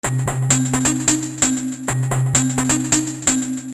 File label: loops